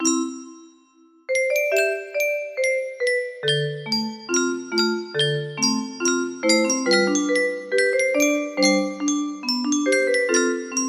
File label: melody